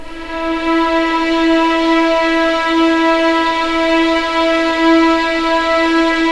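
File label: E4 legato violin, right, The Ultimate String Collection; East West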